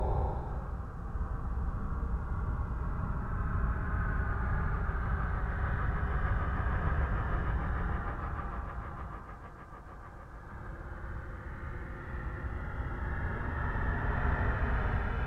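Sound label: music drone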